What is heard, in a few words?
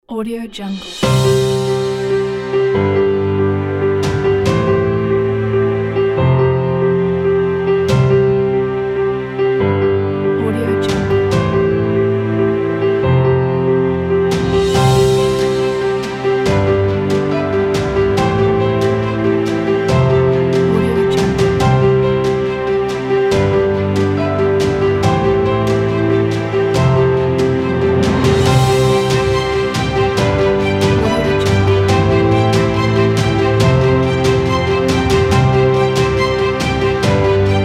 epic cinematic inspirational